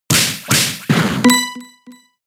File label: Slap; pokemon sfx gen 6 attack moves xy oras; Wake-Up Slap